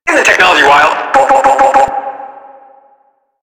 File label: Tentacle Swing; Warframe; Proto Infested Ancient; Abilities